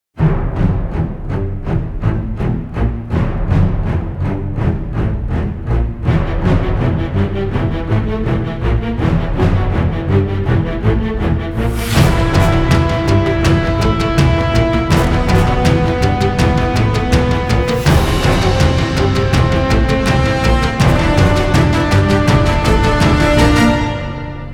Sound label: no choir